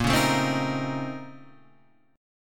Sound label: A sharp Minor Major 11th, A sharp, down, Guitar, Standard; strummed